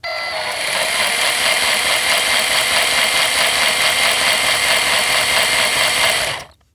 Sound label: Industry Tool Power Drill Slow